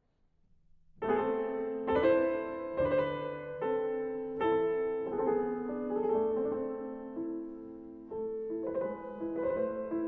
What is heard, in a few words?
Gavotte; Gavotte et six doubles, RCT 57; Rameau